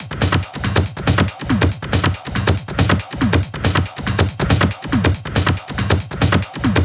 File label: 140BPM Genetic Kit